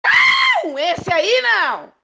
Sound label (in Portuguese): GRITO